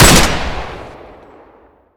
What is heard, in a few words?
pistol fire